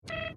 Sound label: computer beep; Business skieme Beta 1